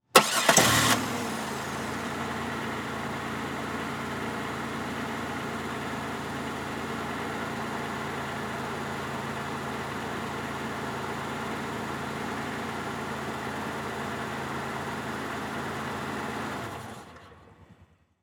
Transportation Car Monte Carlo Start Idle Off Mic Under Engine